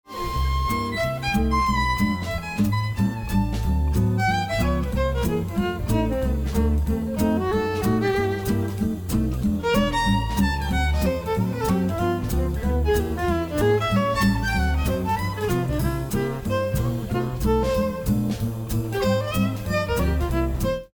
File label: Solo